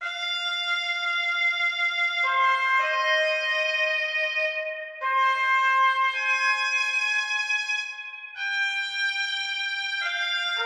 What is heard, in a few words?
fanfare; stores